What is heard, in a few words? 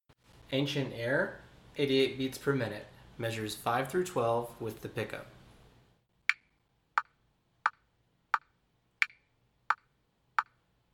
88BPM; Measures 5-12